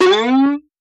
Boing Up; boings

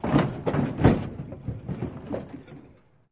Auto Crash Lrg Side S, car crashes

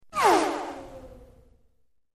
Synth Laser Zap By Pan, zaps

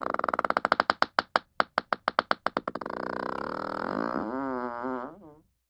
Creaky Farts Crypt

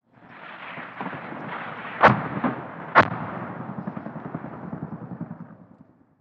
AH1 Rocket Fire Strik, bell-ah-1-sea-cobra

